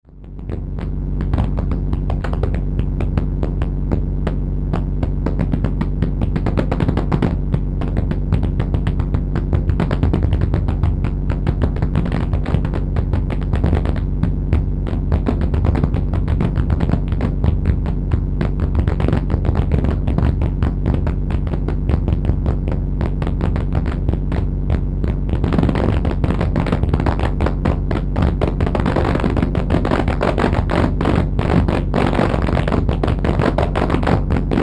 Bed Electrical Hum Static Fuzz Distortion; Blastwave; Beds

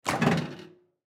Microwave Door, Microwave Door Open; Household; Microwave Door Open Small Appliance

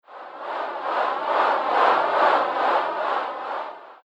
White Noise Loop Tremble; Loopable; Andrew Fleming

